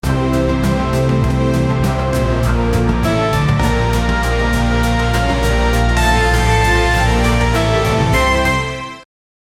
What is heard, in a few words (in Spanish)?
crea una intro musical, intro